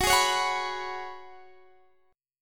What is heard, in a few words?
F sharp Suspended 2nd Suspended 4th, Guitar, DADGAD; chordshape; down